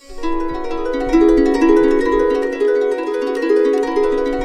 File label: BISBI, Complete Harps; USB Soundscan vol.62; AKAI